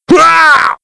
Stair Fall; Playable Tutorial Level

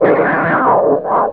Leopard Death; Playable Tutorial Level; TR2

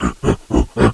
Gorilla Pant; Playable Tutorial Level; TR1

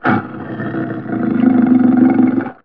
Leopard Strike; Playable Tutorial Level; TR2

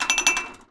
Cups; TR3; Playable Tutorial Level

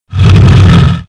Generic Stone Door Low; Playable Tutorial Level

